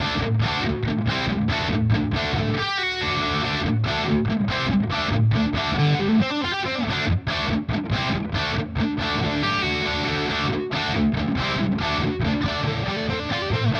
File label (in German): E-Gitarre Lead; Gus G Jackson Signature